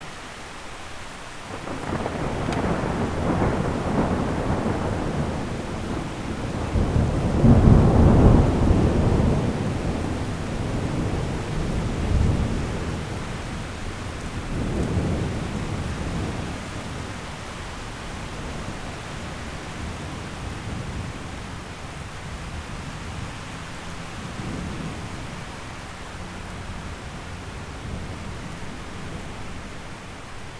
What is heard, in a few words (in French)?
orage